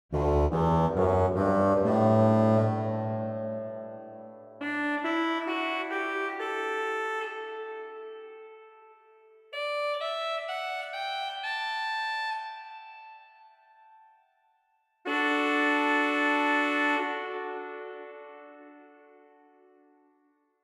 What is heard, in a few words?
Schalmey 8; Zwolle